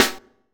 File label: SD, dance drums hip